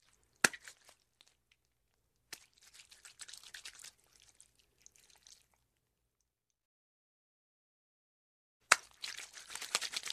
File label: Lotion Rub In Hands; Hollywood Edge Foley Sound Library